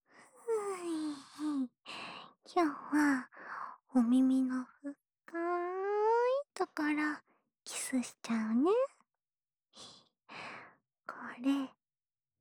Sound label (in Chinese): 自慰輔助用深層舔耳（右耳）; 隣の家にメスガキが引っ越してきた!